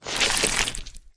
human form long move; flood

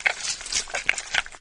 melee, flood infection

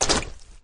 jump up, flood

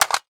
ak47 mag out empty; weapons

